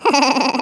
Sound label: LAUGH; Worms Armageddon; Norwegian